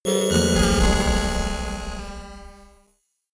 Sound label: horror orchestra short